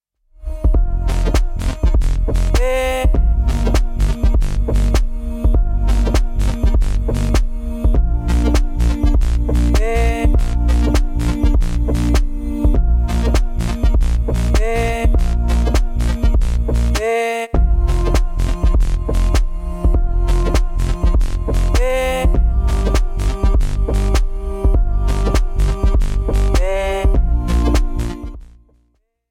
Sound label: gita instrumental